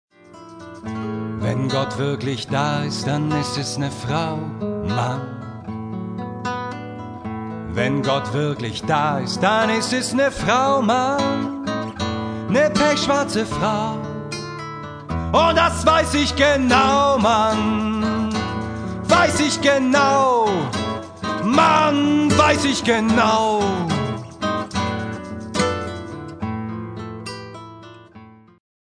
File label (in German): Goettlicher Blues